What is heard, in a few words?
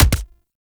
Pistol Punch; Warframe; Weapons; Tenno; Lasrian